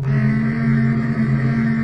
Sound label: tone; Gachitale